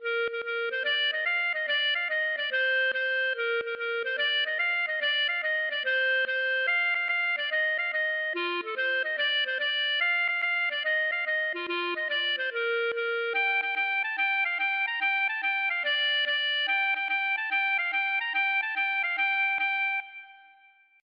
gavotte; Haut Leon

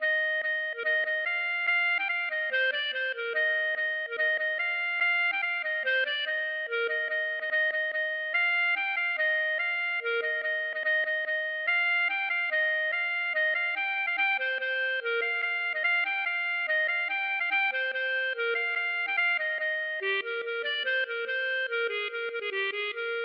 gavotte; Glasik

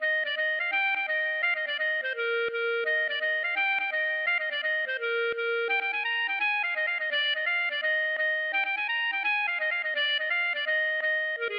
gavotte; Suite Riou Irvoas

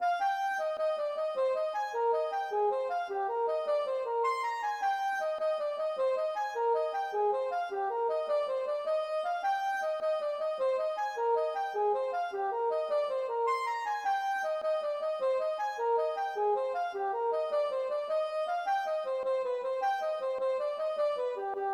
jig

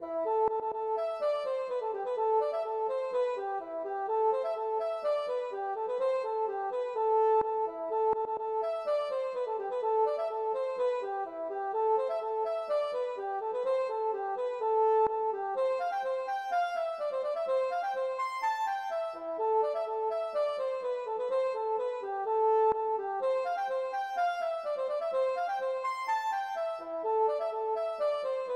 polka

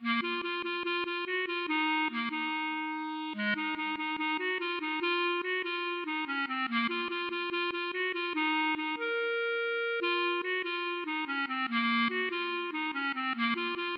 klezmer

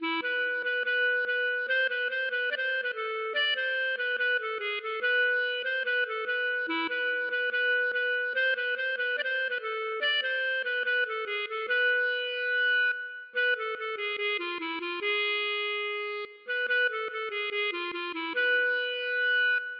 klezmer

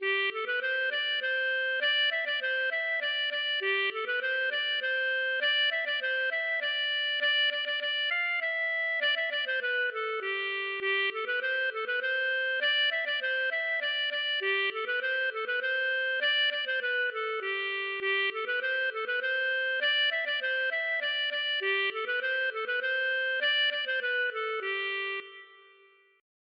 hanterdro

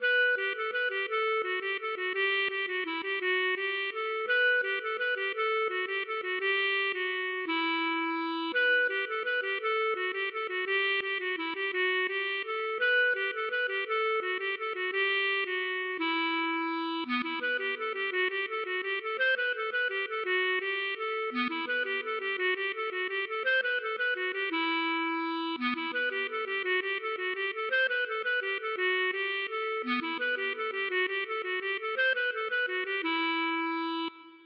mazurka

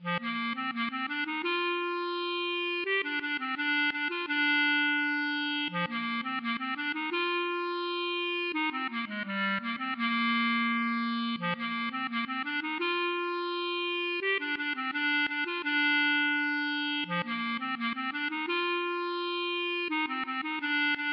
klezmer

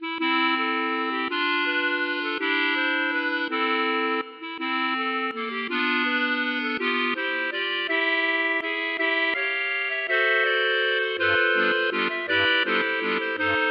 klezmer

